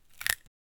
picking strawberry from b; garden

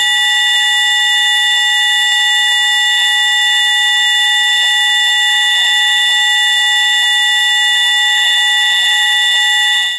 a loud clear and definiti, game show

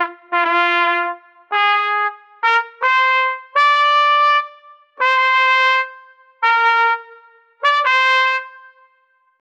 off key trumpeter, out of tune

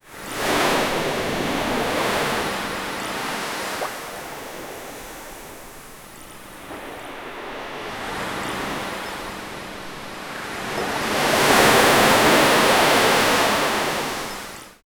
sound of waves in beach

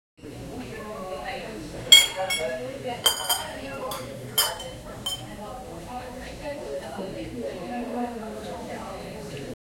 restaurant ambiance sound

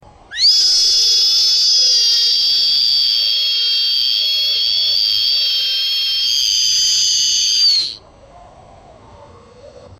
constant hot air buzz

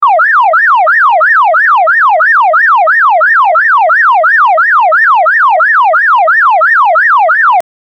police car sounds; law enforcement

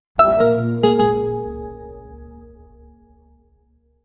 sub hit

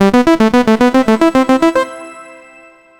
a triumphant upbeat, chiptune

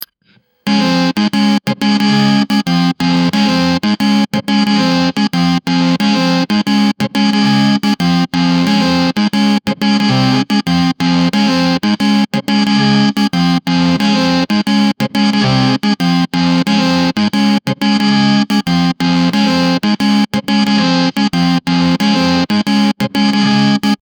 indie rock guitar riff

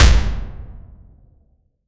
one cannon fire with a; arena

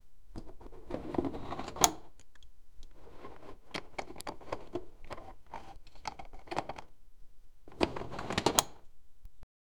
create a sound of opening, latch

